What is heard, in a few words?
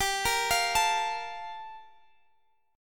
G Minor 9th, Guitar, Standard; arpeggiated; x,22,20,22,22,x; JGuitar